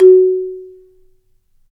Marimba; Roland LCDP11; Africa VOL-1; Af.Marimbas

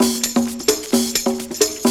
130 percussion; Sound & Vision - Gigapack I

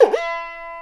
INDIAN, DrumBase; Crypton - DrumBase5000

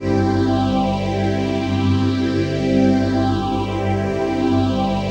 PHASE PAD

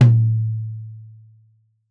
Tom2 Stereo, Deeply Sampled Drums; SMDrum

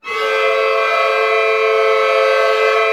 ORC CLUST, Chord Cluster; Roland L-CD702

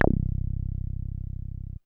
Dance Floor Bass, Multisample Basses

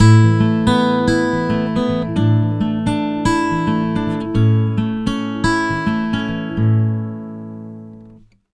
110 MAJ PICK A, Acoustic Guitar Loops; USB Soundscan vol.04 - Electric & Acoustic Guitar Loops; AKAI

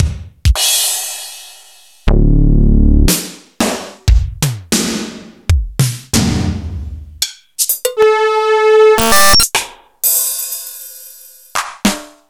Roland D-70; Sliced

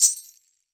wrist, percussion; WORLD PERCUSSION; MIXED PERCUSSION; Best Service ProSamples vol.52; World Instruments 2

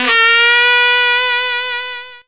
FUNKY SAX; Zero-G; Funky Elements

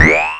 BOSS PERC SNTH, DRUMS PERC; Rubber Chicken Software Sample Bank

